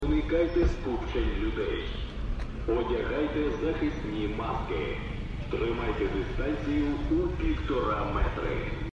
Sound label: police